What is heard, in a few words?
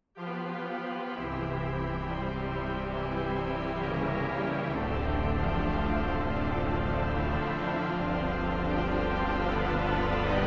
Tutti vs Solo English Horn; p450 ranks